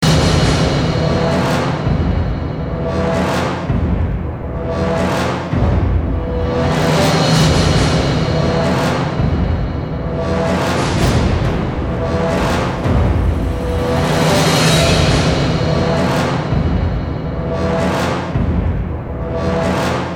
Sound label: Ambient Tension; Necrovision